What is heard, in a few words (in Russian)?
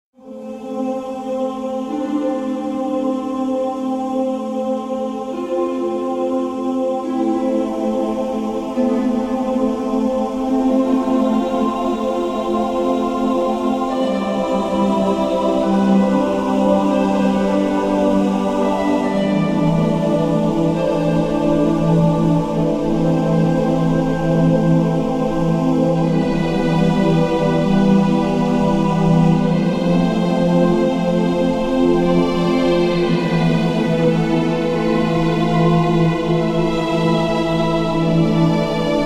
fonovaia muzyka bez slov; dlia ekskursii